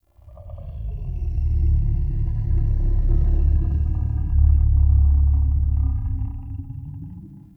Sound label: Root Cave Ancient Tree Groan; Neathuns